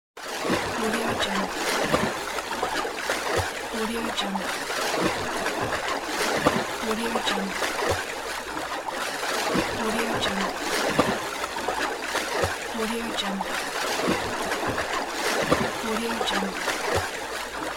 swimmimg

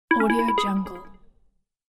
arp discrete notification

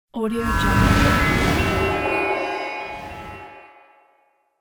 dark shine dust swoosh

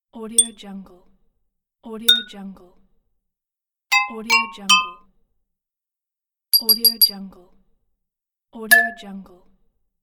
glass notifications

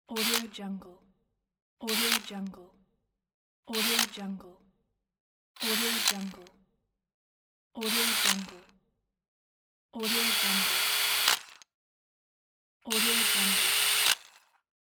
cordless drill quick run with brake

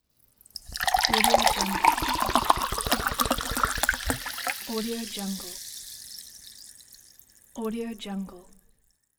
pouring soda

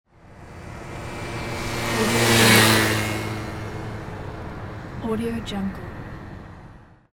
motorbike passing by; ed-soundmaker

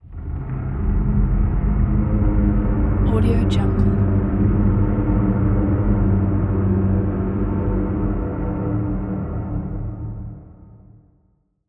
tension drone; asylum

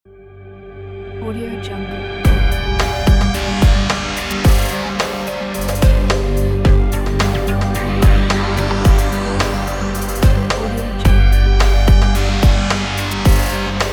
space ambient opener logo; omsound

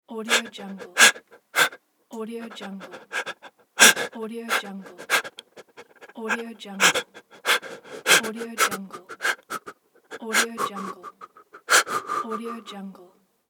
man scared breathing

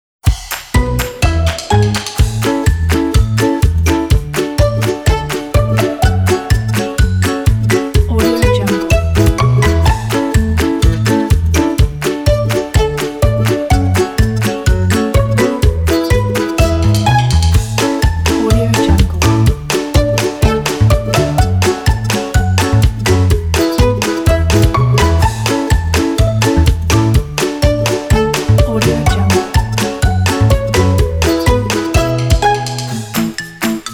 children music

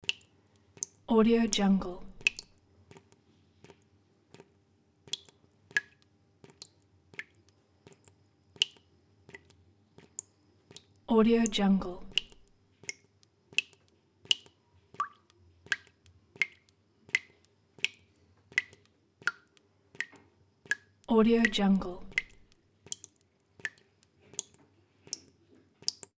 water drips and drops slowly in sink faucet

